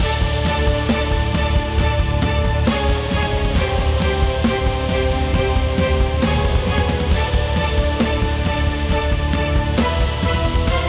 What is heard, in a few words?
BGM boss witch; starbites